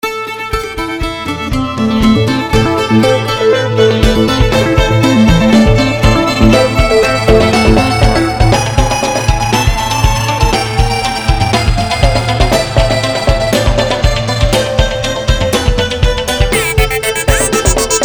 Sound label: A minor scale